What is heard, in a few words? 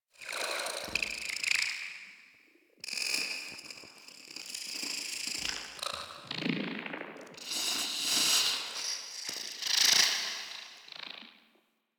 Tech One Off, Ambience; Vitruvian Memory